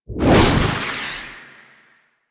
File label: Fire Ball; Warframe; Ember